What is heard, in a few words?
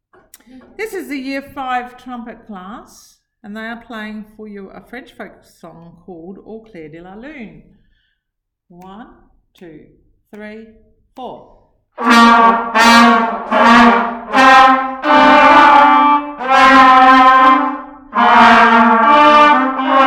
Trumpet Au Clair De La Lune; Year 5